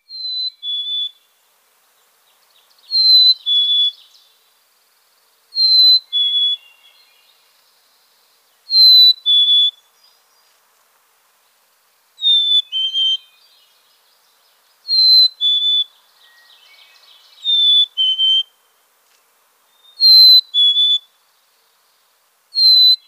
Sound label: Chickadee hey sweetie